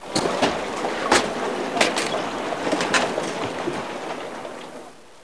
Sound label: warehouse; Caesar III